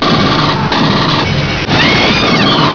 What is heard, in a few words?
scream